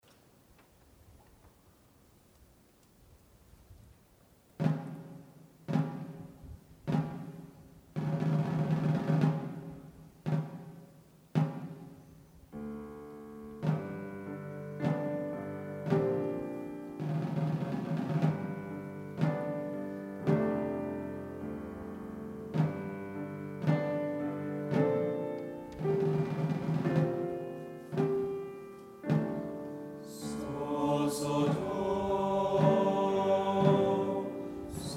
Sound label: Taps